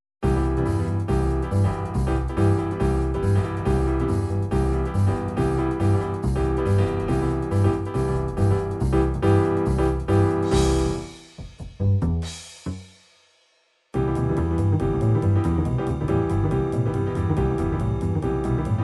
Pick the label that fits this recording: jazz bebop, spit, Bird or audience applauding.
jazz bebop